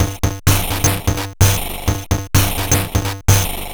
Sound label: Bent TR505 (128BPM), Circuit Bent